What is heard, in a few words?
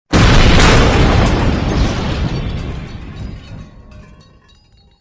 walkway crash; movers objects